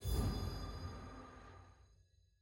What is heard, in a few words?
login button sign in hover